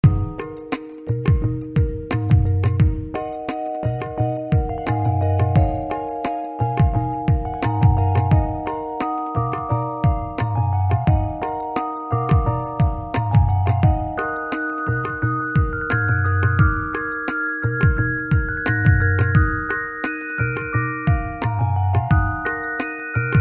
music

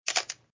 buy; chicken

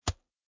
kick; chicken

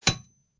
knock; chicken

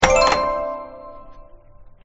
Chest Layer Broken; gardenbloom

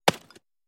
wood Debris; tntbomb

